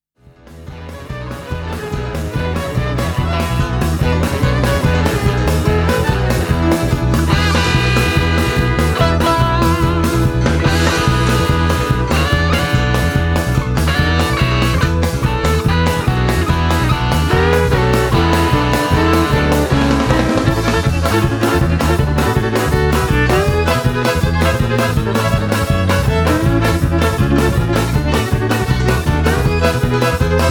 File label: Band Wild Bluegrass Jam; Saloon Star